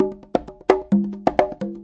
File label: 090 bongos, 090 bpm; Bazillions of Bongos